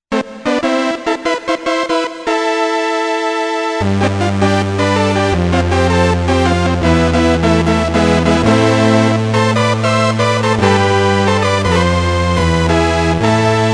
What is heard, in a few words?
brass